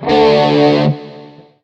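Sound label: metal Short Power chord D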